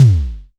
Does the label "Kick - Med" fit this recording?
Yes